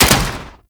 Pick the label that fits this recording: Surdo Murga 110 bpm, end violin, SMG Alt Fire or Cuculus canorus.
SMG Alt Fire